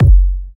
round kick single hit F sharp key, kick drum tuned to the F# note; kick drum samples